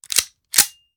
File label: Hand Gun Foley; Sound Ideas; Guns